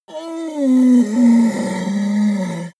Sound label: yeti groan pain